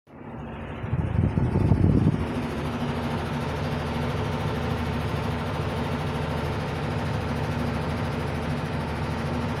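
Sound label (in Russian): звук стиральной машины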